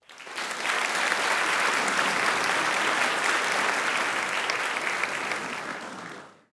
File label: win